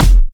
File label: trap kick drum e key; kick drum samples